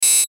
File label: BUZZ ELECTRICAL; Multimedia; Blastwave